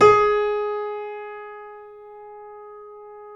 AB3, YC7 Piano mf

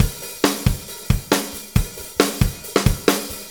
Drum Tools, Loops; Sonic Foundry (Sony Creative Software); Various Loops

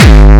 kick

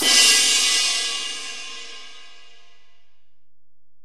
Ride, Alesis DM-PRO, Drum Machines

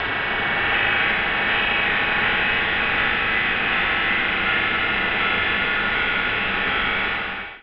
SPACE-FX

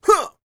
A YELL, MALE HUMAN; ILIO; Vocal Planet

